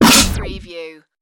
wobble voltage overload

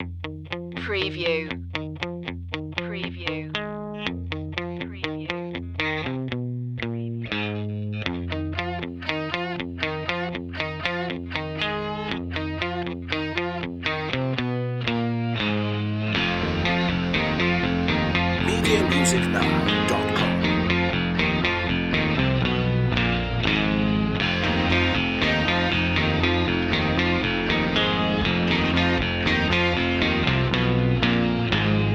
escape no perc

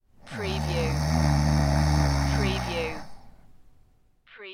Snoring, Male Snore

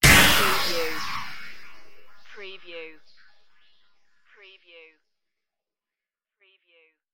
futuristic weapon strike

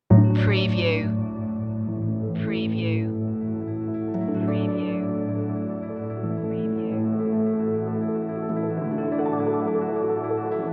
angels and hope stinger

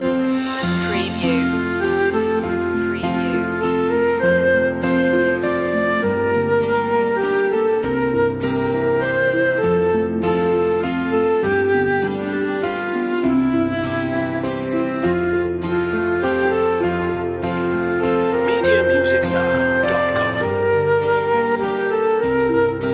orchestral loop; away in a manger; media music now

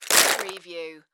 Nut Pile Drop Nuts

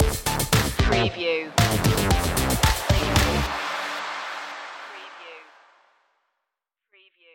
funhouse 84 stinger

